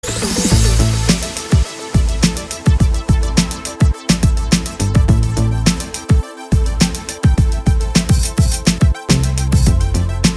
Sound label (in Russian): spokojnaja trance muzyka